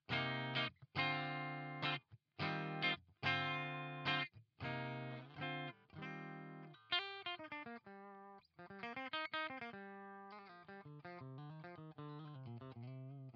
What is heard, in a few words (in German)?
Harley Benton CST 24HB clean SPLIT steg